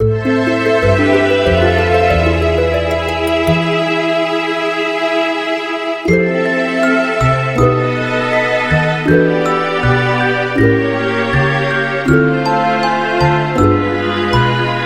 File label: Death